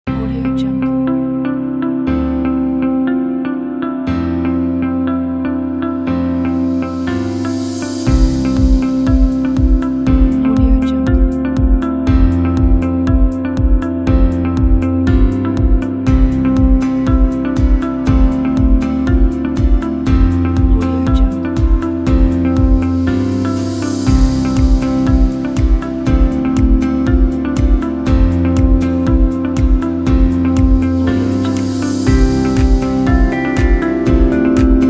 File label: corporate motivational; ramolpro